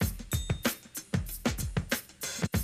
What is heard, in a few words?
Classic DrumLoops